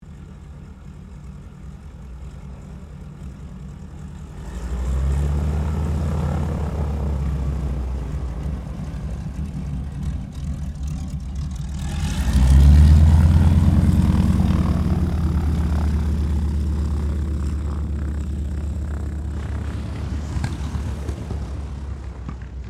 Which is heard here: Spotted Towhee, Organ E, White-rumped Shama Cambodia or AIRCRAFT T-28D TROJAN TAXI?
AIRCRAFT T-28D TROJAN TAXI